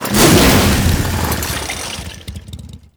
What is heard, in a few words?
Infested Hammer Whoosh, Weapons